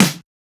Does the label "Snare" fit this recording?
Yes